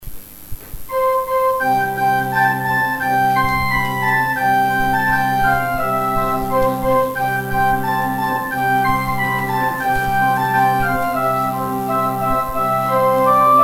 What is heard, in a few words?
organ interlude; Interlude during the collection; St. Mungo's